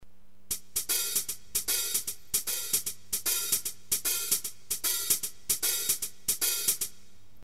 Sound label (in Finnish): tikkitakki hihat nopea